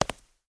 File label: Step Marble